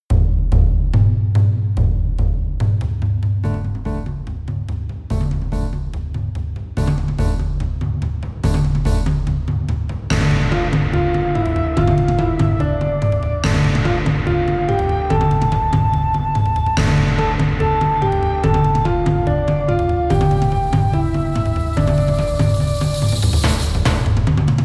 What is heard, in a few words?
Forest Drums